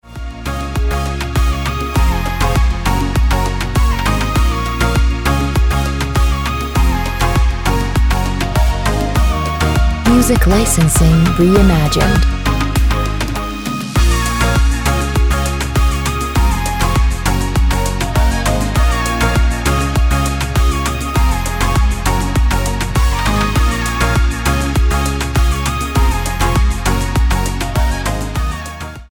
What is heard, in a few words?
Beach Vibes Ringtone